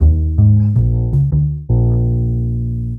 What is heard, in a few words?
Standup Bass, Drum N Bass; Sonic Foundry (Sony Creative Software); Knutrix Drum & Bass